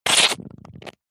foley liquid squirt hard fast short foamy frothy bubbly